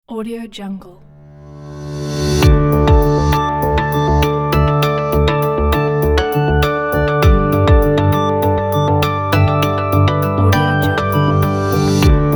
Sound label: technology ambient corporate; artarea studio